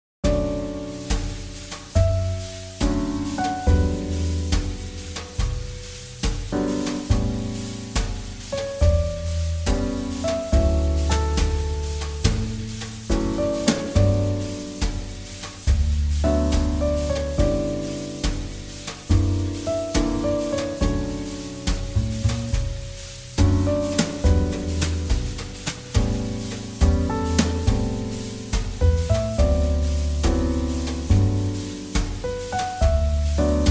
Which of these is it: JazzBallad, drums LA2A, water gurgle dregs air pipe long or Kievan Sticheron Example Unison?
JazzBallad